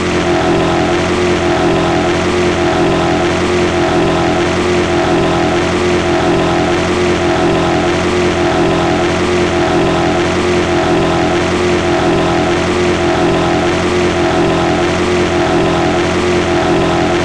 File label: ex CLOW; Italy; AOS F1 2022